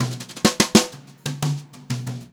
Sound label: FILL, Brushed Drum Loops; USB Soundscan vol.39; AKAI